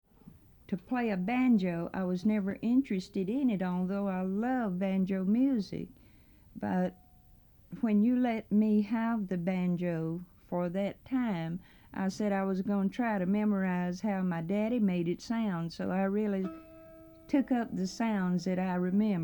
playing the banjo; LET'S TALK, BUTTE!

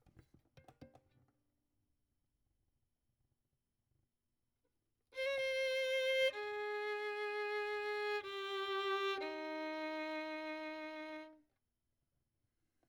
Violin; Verdigris Music

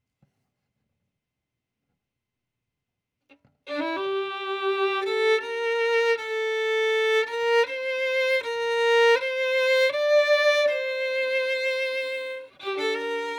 Violin

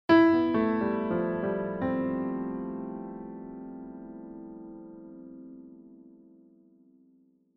Evening News Theme Stinger; News Hour

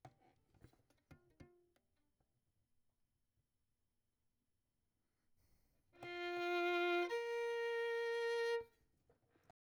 Violin; Verdigris Music

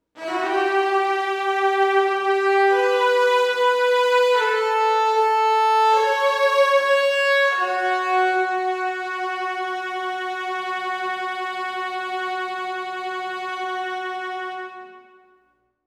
Violin; Verdigris Music

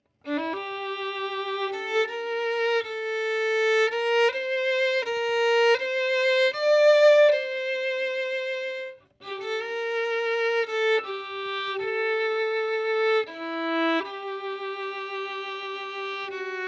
Violin; Verdigris Music

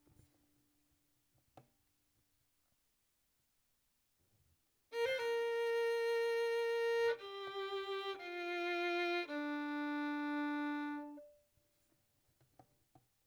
Violin; Verdigris Music

